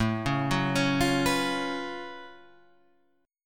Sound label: F sharp Minor Major 11th A